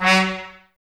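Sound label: Brass trumpets F#, Mute; Miroslav Vitous - Woodwind Brass Ensemble; Tps Mute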